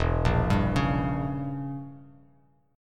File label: E Diminished 7th E, Bass Standard; Edim7 arpeggiated; JGuitar